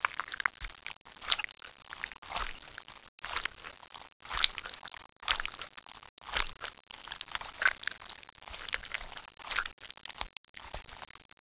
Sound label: MEAT CHEW